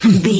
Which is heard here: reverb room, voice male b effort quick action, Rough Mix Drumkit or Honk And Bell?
voice male b effort quick action